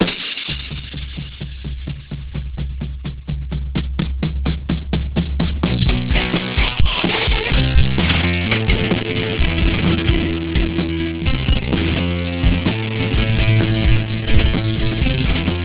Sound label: generic rock; opus